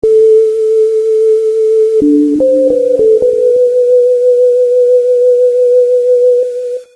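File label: sine wave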